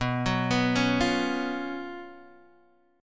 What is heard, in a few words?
Gb Major 7th Suspended 4th B, guitar